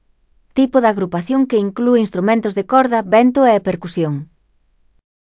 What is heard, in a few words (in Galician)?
Orquestra sinfonica